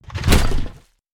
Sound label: coccoon god body fall out; Hollow Knight